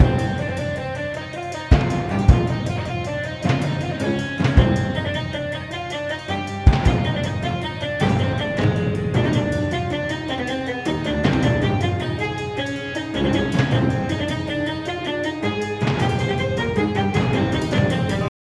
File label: High Action Loop 105bpm 8bar; project zomboid